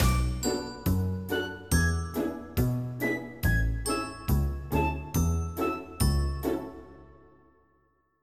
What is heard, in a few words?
cadence